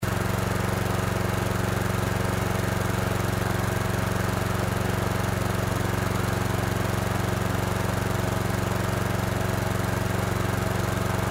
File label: lawn